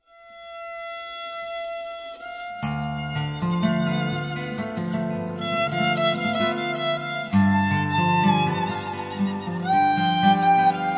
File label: violin Solo